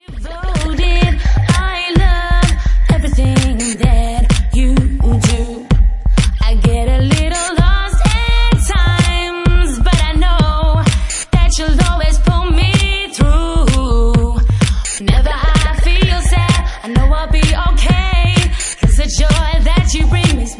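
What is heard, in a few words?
Tech Organ Mix, Bassline House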